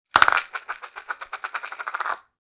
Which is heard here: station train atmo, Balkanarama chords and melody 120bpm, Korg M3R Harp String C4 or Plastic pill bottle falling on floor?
Plastic pill bottle falling on floor